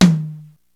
NEW KIT DPM3, Peavey DPM; Rubber Chicken Software Sample Bank

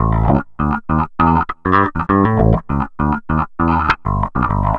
Bit Reduced Bass, Electric Bass; Sonic Foundry (Sony Creative Software); Leo Cavallo Bass Taster

